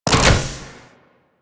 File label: Gas City Claw Lift End; Ambience; Props; Warframe; Corpus Gas City; Props Remaster